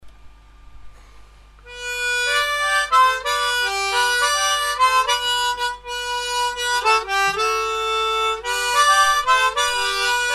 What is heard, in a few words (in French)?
daubigny armonica